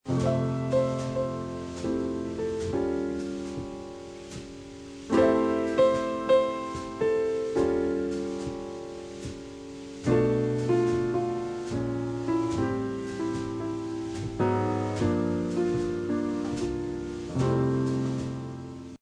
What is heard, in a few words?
Sad Piano; BaD Radio; Charlie Brown